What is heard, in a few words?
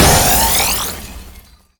clear btb; tetrio